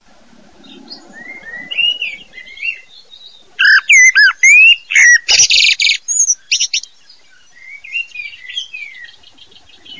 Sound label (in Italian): MERLO, birdsound